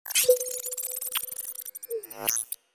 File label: loader enter